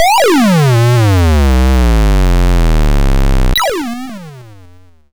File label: YM Twister; SFX; CHIPSHOP DELUXE; CHIPSHOP HITS